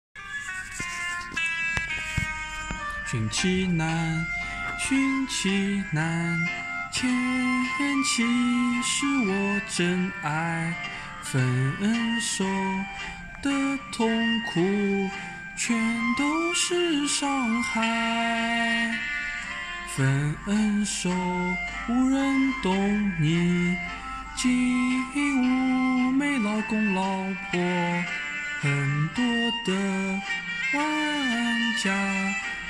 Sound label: sing